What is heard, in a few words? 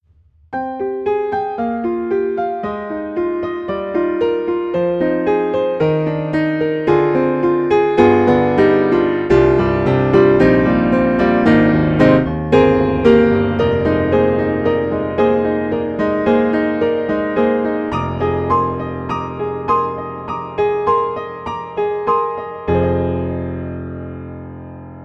Pianoteq D4; MODARTT S.A.S.; Bebop